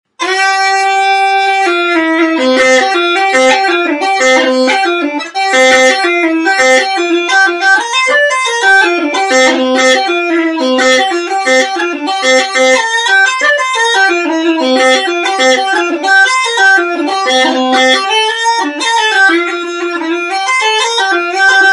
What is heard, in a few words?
C chanter, uilleann; vickers